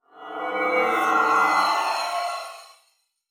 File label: Teleporter Abduction Fail; Evolve; Wraith